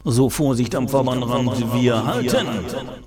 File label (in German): Jingels